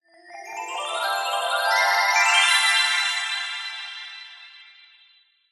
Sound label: magic glissando 90s style dreamy ascend reveal; fantasy